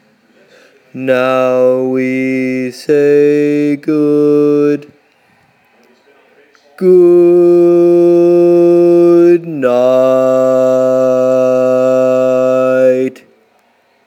Bass, barbershop tags

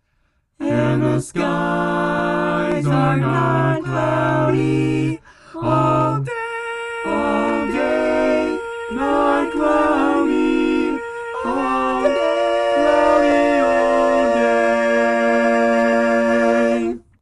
Tenor, barbershop

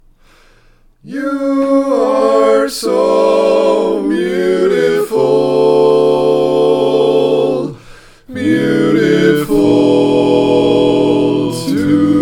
Tenor, barbershop

